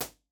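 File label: average space impulse response, live room drum set; genesis 6 studio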